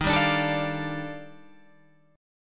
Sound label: D sharp Major Sharp 11th, Guitar, Open G